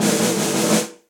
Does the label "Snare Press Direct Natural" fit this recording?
Yes